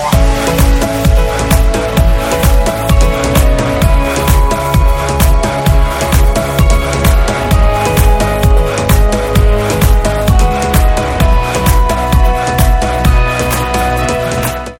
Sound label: Ringtone for iPhone